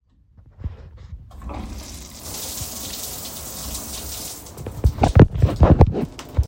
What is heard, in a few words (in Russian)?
vklyuchenie dusha